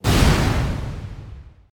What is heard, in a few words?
Wizard Firestorm Impact; Idle Champions of the Forgotten Realms